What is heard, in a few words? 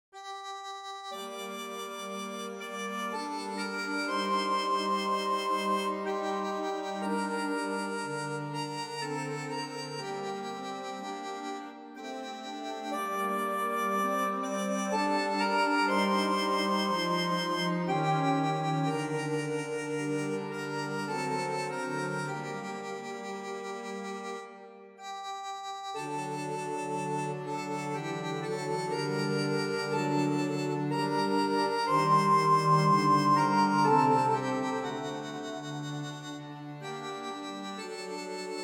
Vieux noël Gm, harmoniums; L Organiste; Franck